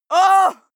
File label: female fight shout pain; schreie; menschen